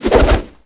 Water Baridon atk

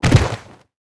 Die; Kenton; Gamigo

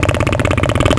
Wedding Car Walk; Seer; GamigoNA

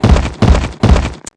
Rock Stand

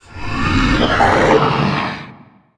shock scream

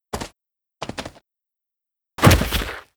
Snowy Puggy Die; Seer; GamigoNA

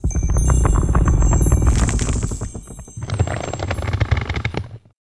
Giant tree

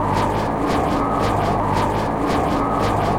run

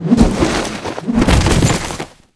Knockback Fly

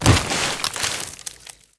lips die

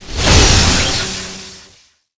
Bash Strike

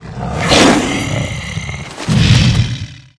Flying Lion Action; Seer; GamigoNA

